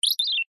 science fiction robot chirp beep very short slightly cartoonish